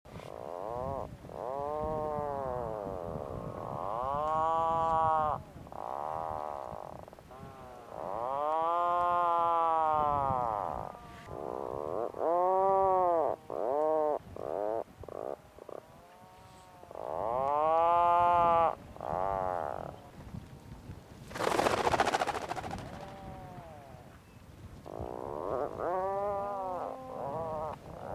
Atlantic Puffin Sound

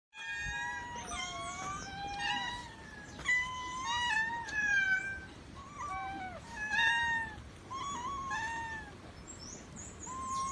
Cute Cat Meowing Sound Kitty Calling

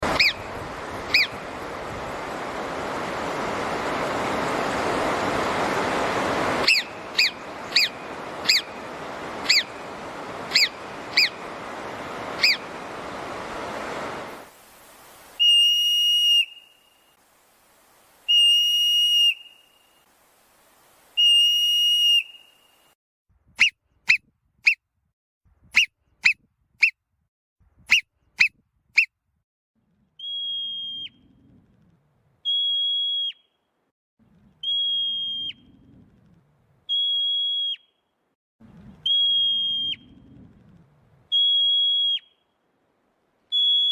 Marmot

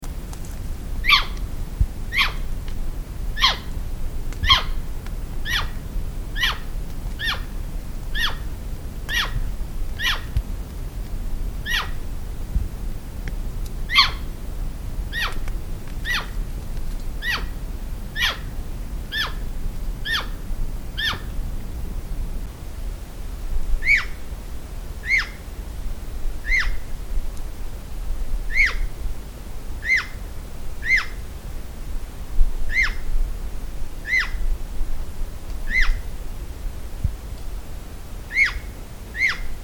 Flying Squirrel Sound

